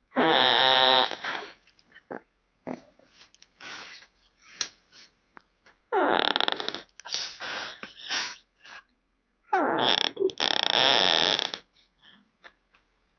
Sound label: bed creaking during